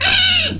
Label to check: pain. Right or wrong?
Right